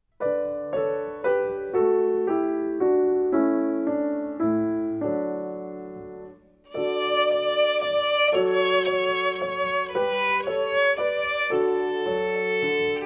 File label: french folk song violin